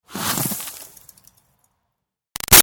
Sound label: Snow Dust Sweep Fast; Weather; Big Room Sound